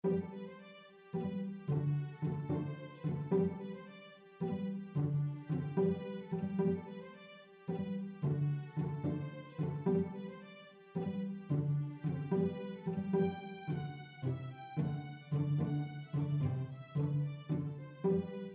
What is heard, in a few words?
Cello